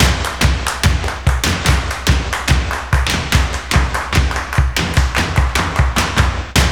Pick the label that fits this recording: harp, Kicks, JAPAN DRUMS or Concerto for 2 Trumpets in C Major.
JAPAN DRUMS